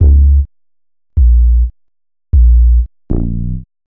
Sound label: Bass, Futurist Drum & Bass